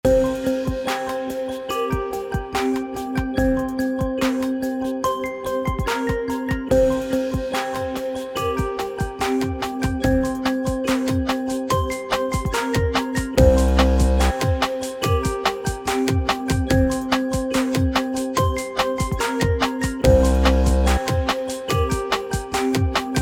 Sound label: Menu Music; Berry-Dash